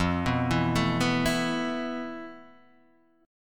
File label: F Major 7th Flat 5th; arpeggiated; FM7b5; 1,2,2,2,0,0; JGuitar